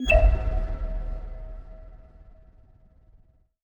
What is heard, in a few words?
notification subtrait; Stellaris; paragon